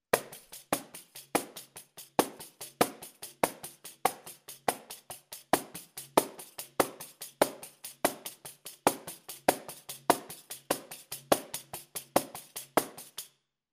bossa nova, pandeiro

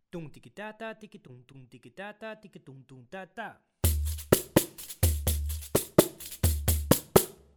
Dumdikitata Intro, pandeiro

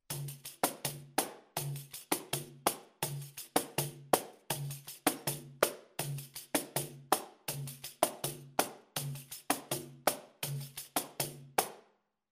capoeira toque variacao, pandeiro

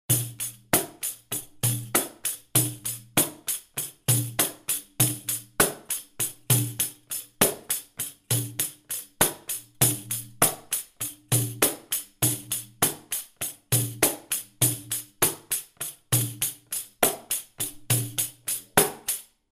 Pop groove gesamt normal, pandeiro

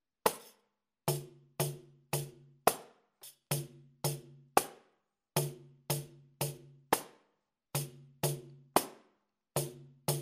partido alto slow, pandeiro

